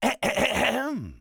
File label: clears throat; BATTD; jake